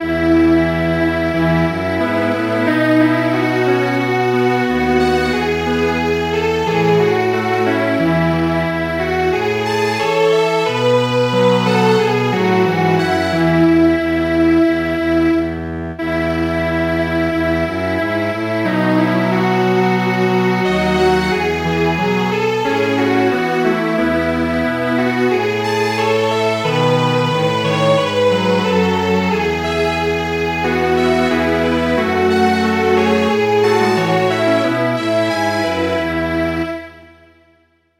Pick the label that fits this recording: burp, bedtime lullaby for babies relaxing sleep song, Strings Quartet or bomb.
Strings Quartet